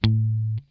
NOTE SCALE, Funk Guitar; 90 s Sample CDs; Zero G

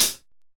DUAL CHH, LOFI HATS

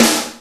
DR550 Snare, Boss DR-550 Drum Machines; 300 Drum Machines

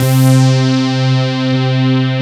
FAT POLY; Pro-Rec - Synthsonic

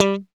GTR Funky Strat; 90's Sample CDs; Club-50 - Foundations Roland; Funk & Mute

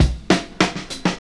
Fills, Roland; Sound & Vision - Gigapack I; Perc

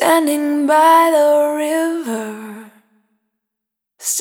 Jericho, Single Voice Melodic Loops; Sony Creative Software; Pocket Diva World Class Vocal Samples

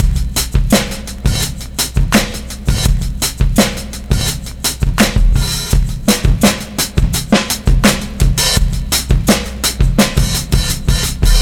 DRM, Breakbeat; Best Service ProSamples vol.24; AKAI; SWEET 16

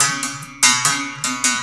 BERIM GRV, BERIMBAU; Spectrasonics; Heart of Africa 2

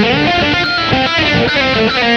guit lead; Sonic Foundry (Sony Creative Software); Ugly Remnants VOL-2; Leads

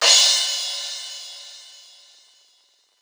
Crash, Drums Cymbal; Best Service; House Definition VOL-1; Cymbal Stuff

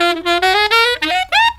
SAX, Killer Brass Riffs

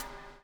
BUBL; Spectrasonics - Backbeat

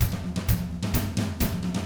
127 Drums

